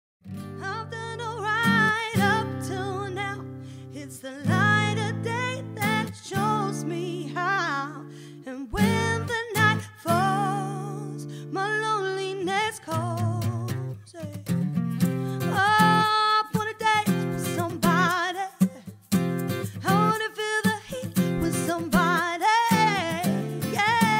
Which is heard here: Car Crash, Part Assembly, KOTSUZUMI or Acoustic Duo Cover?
Acoustic Duo Cover